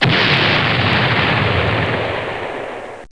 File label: explod; Total Chaos Nukem 3d Pack; duke